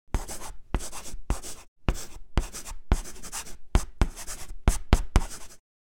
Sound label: Chalk stick writing on charcoal board